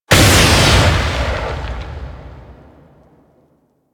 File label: Jet Polearm Ground Slam, weapons; Grineer